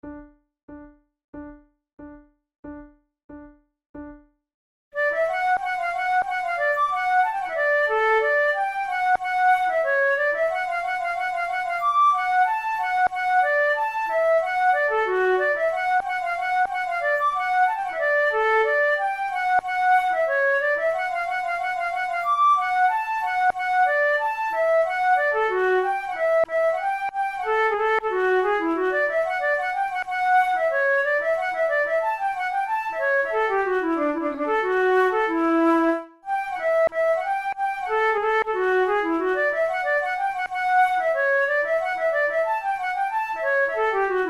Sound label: duet